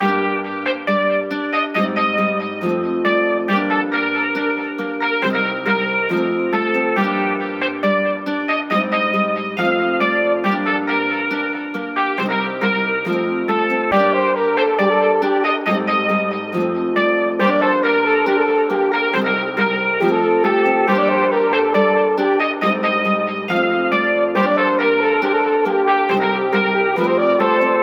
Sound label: 138bpm Energetic Inspirational Dm